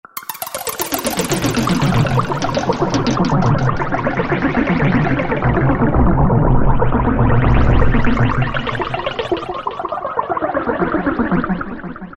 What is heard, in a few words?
Creature